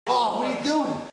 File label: hurt light; billy